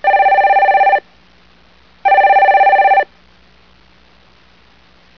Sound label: Phone